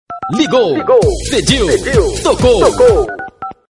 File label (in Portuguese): vinheta